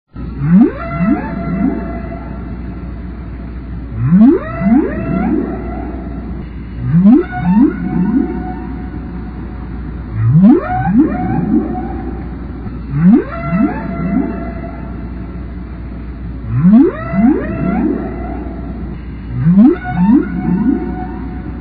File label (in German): walgesang